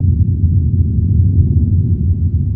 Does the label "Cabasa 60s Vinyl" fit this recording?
No